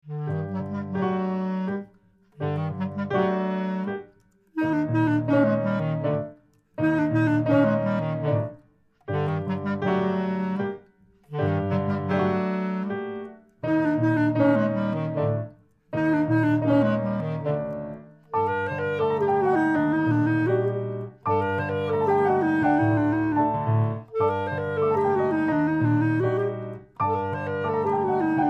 nigun fluide

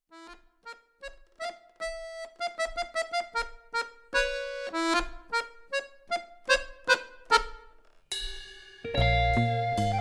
klezmer

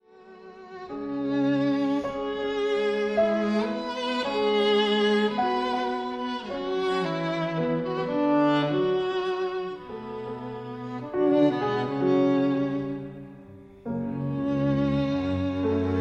trio in la minor, allegretto moderato; georges enesco

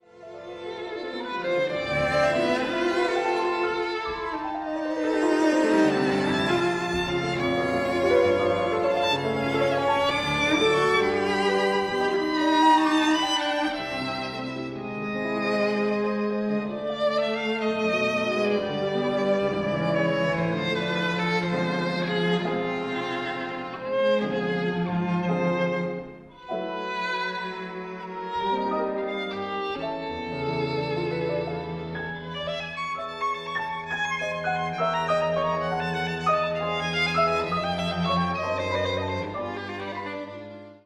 trio in la minor, allegro moderato; georges enesco

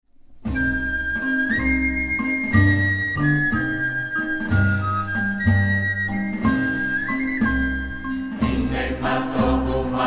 Israeli choral; shevet achim; chorale israelienne moadon

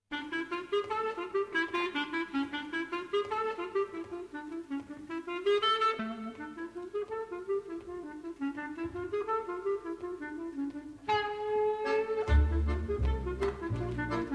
instrumental; schain

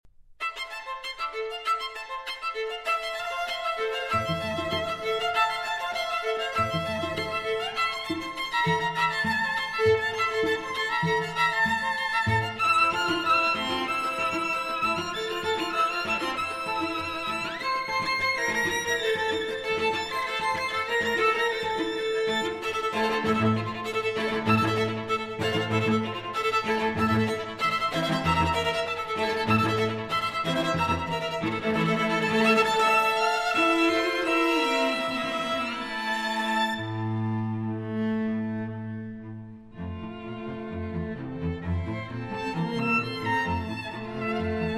string quartet; walter kaufmann; string quartet n 7; 5e mvt

